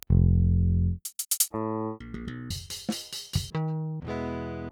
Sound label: Pultec; Virtual Analog